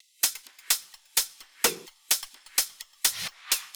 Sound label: Drum Loop 128BPM Solo Overheads; VEC5 Drumloops; VEC5 Multi Loops; Loop Elements